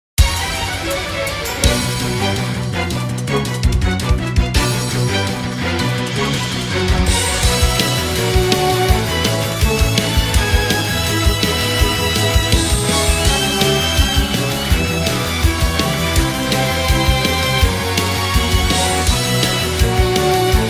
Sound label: Battle; RPG Maker MV OST Battle 1